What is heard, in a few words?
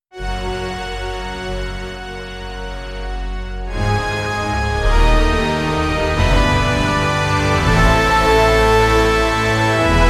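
craft a 10 second ultimate, brass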